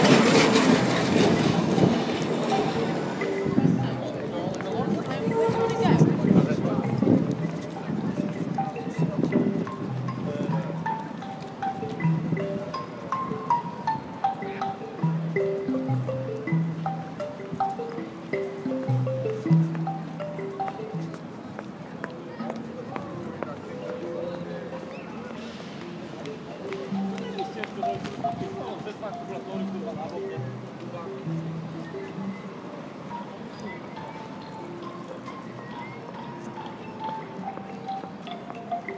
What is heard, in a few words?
60 seconds of gardens ambience; picadilly gardens manchester